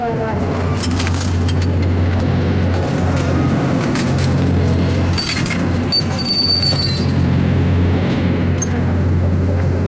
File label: massive trading ship, ship